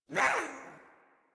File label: Pet Speak; Warframe; Catbrow; Pet Ship